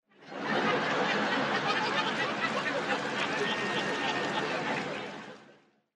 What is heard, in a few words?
audience laughter with applause